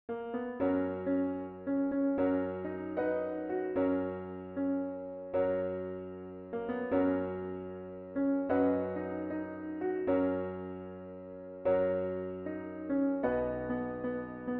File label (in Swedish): Men om bara min piano in Gess visa